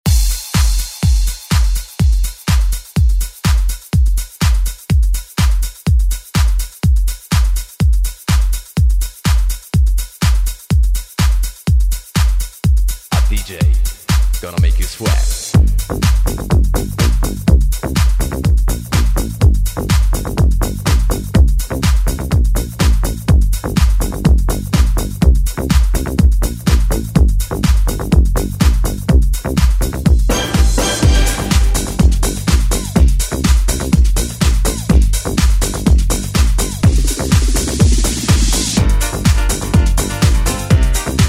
90s ReDrum; DJ Mhark

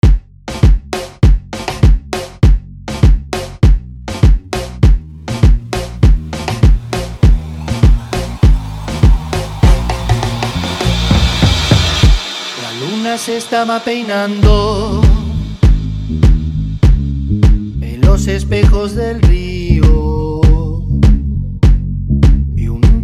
Acapella Break remix

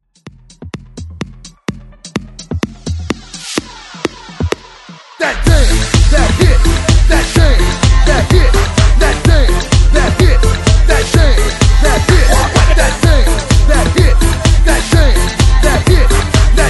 Hype Re Drum 127Bpm

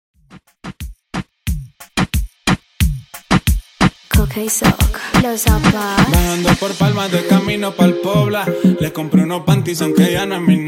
In Outro Reggaeton 90 Bpm Clean

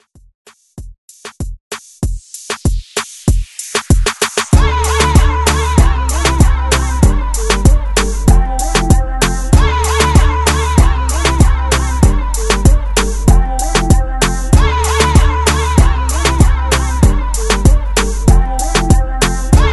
Reggaeton; Redrum

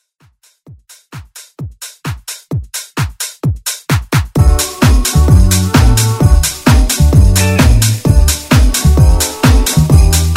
Xmas Redrum

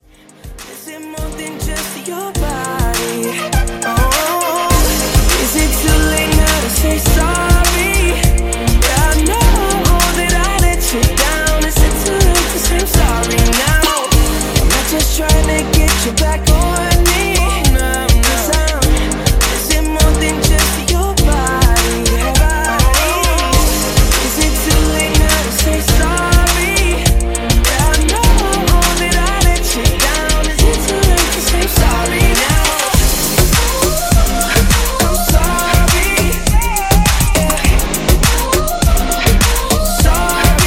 102bpm Eb

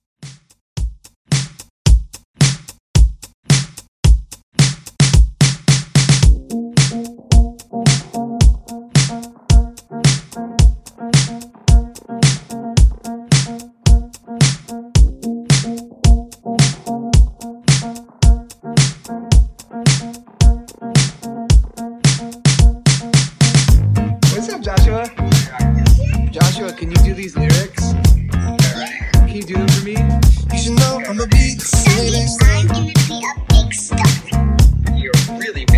Top40 Extended Mix 110 Bpm Clean

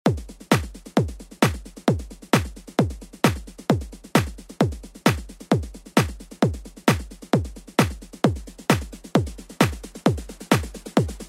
Tech House; Deejay Hit

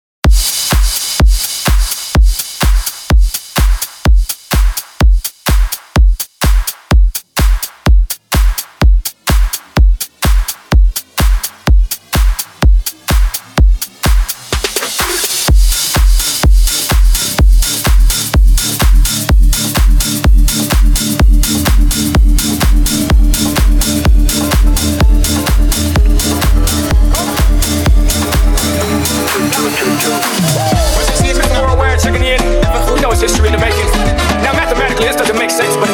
Transition Remix

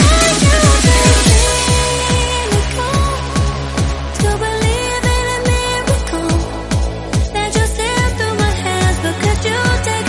143bpm Dbm; Redrum

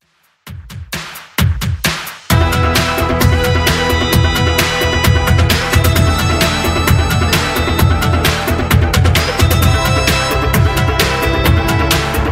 80s Redrum 131bpm

